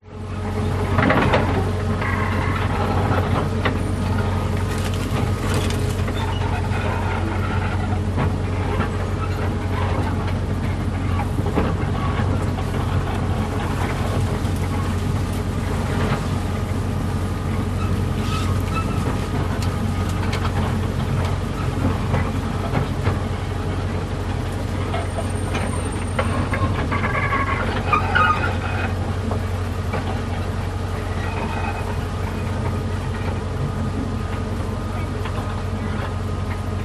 train miniature railway engine carriages onboard slow tracks squeaks, vehicles